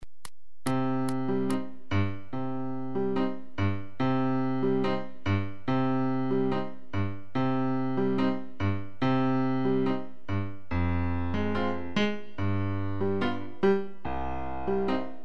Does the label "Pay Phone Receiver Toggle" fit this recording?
No